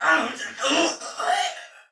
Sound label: Death, Soldier Male; Dialog; Barks; Battle; Sinecure